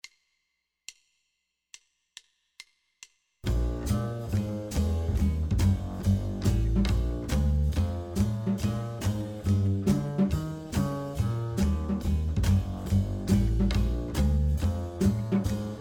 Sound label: Blues Piano